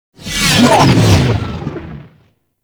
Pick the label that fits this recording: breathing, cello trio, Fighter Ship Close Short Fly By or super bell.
Fighter Ship Close Short Fly By